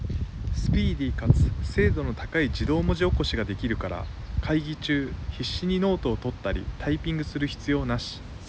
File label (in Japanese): 外 iPhoneマイク